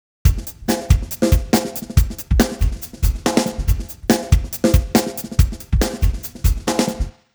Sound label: drums; i3 dsp quattro x; timestretch